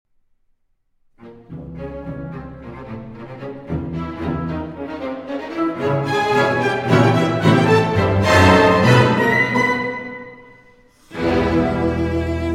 Cello Concerto in E Minor, Allegro; Elgar; Op. 85 - 4.

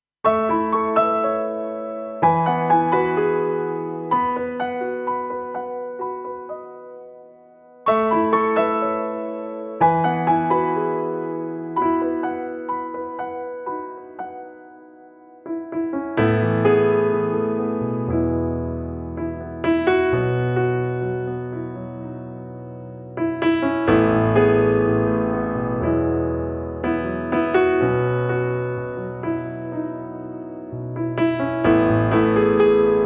(Piano Version)

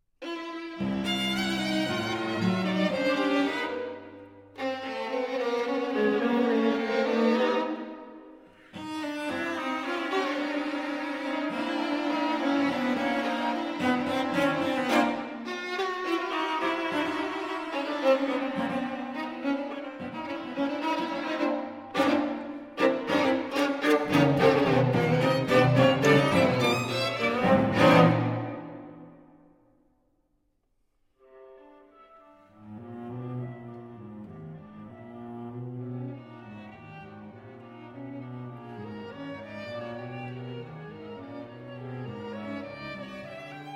String Quartet in C, Allegro

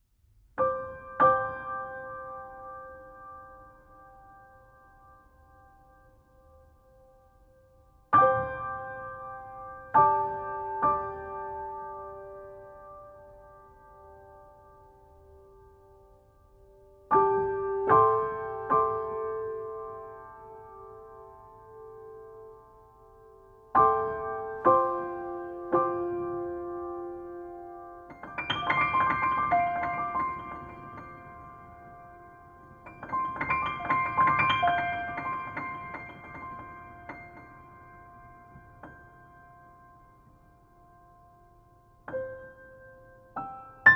It's Raining, It's Pouring (Instrumental Version)

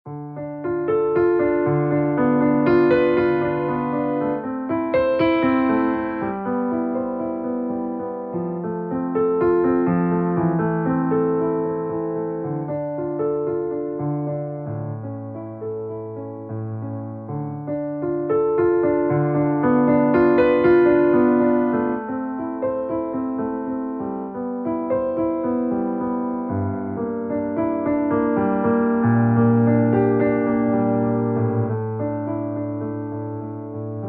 Tikkoun (Piano 1)

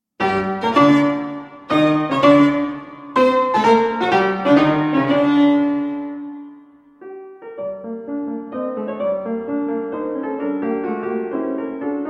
Violin Sonata No. 3 in G Minor, I. Allegro Giusto

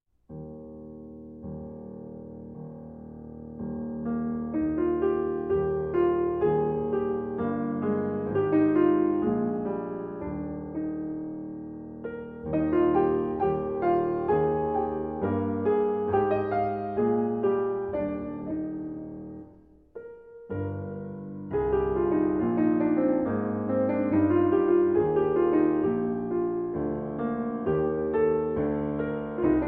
Solo Piano; Wachet Auf, Ruft Uns die Stimme, BWV 645

